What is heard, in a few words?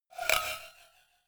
cowbell scratch hit clank muted